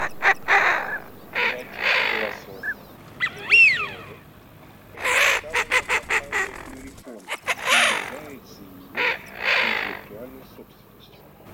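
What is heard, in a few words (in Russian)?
Красноголовый нырок Moriglione, манок; plurifon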